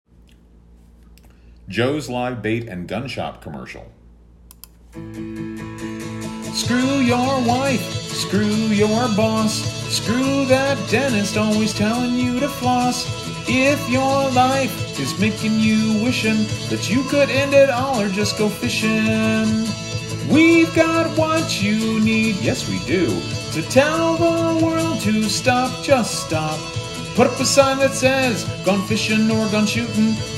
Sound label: Vocal